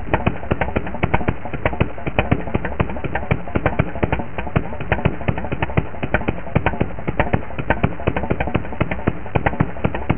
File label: idle, harley chopper